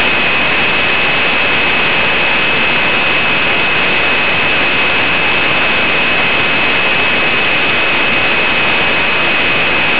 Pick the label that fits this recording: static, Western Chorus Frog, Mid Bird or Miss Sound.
static